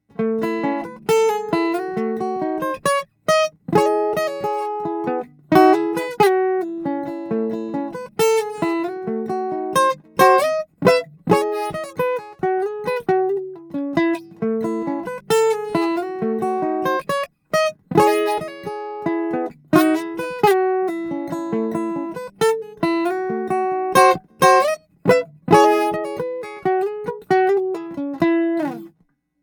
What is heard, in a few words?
J45 Soundelux E47, guitars